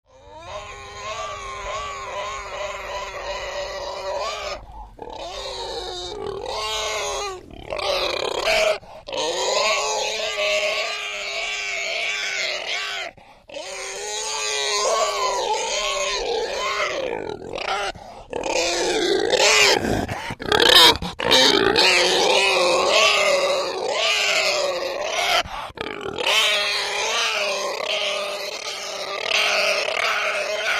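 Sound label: piglet voice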